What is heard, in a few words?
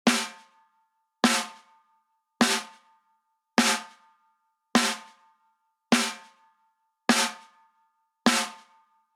smack Attack Sustain On